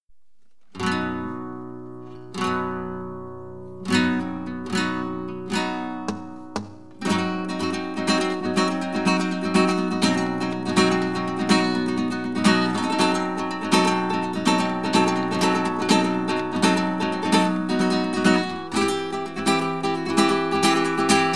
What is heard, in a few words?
Buleria